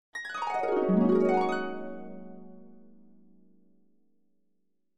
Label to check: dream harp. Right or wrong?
Right